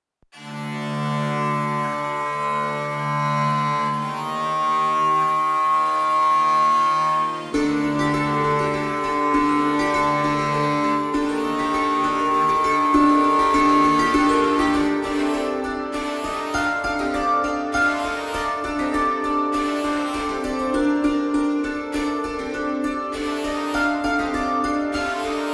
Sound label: Sewers Combat; Novigrad